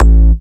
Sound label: bass techno